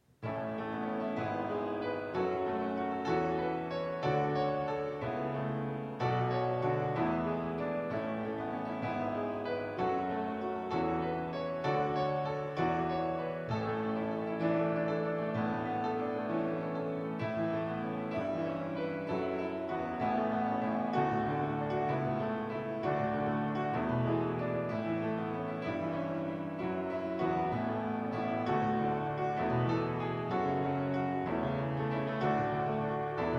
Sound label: Piano